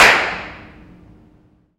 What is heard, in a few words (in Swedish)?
kopparhammaren stora trapphuset; Impulsrespons stora trapphuset i Kopparhammaren 2, HT2017 - handklapp; ortf